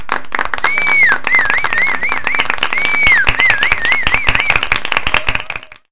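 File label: Happy Claps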